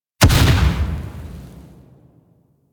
Goo Gun Charged Shot

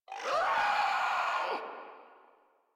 Infested Crawler Attack Short; Warframe

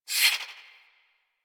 Swing, Melee; Balance Two; Warframe; Weapons; Modular Melee

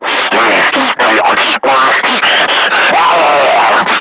Screamer Idle; Warframe; Lasrian

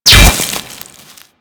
Bolt Impact Stone; Warframe; Weapons; Cross Bolt

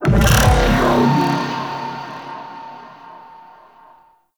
Call Backup Activate; Warframe; Corpus; Arachnoid Camper Terra; Abilities

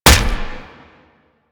Inf Punch Impact Flesh, Infested; Warframe; Weapons; Inf Kick Punch

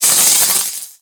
Sword Elemental Electricity Whoosh; Warframe; Teshin; Weapons

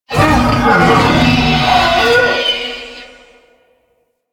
Wolf Death; Animals; Warframe; Quests; Duviri

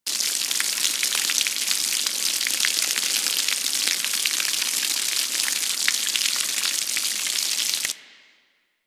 Infested Water Spill Small Loop; Warframe; Corpus Infested

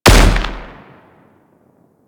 Anti Aircraft Gun 3D Shot D; Weapons; Warframe; Archwing

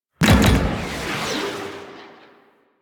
Fly By; Sentient; Warframe; The New War; Grineer Eidolon Shotgun

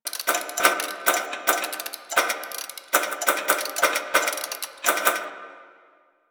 Clock Machine Small Malfunction Loop; Ambience; Props; Warframe; Corpus Railjack

